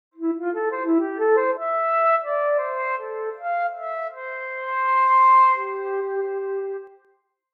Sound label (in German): melodisches Floeten Intro